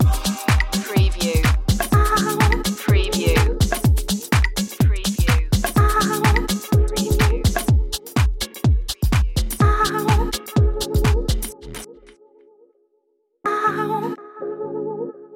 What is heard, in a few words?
funky changeling loop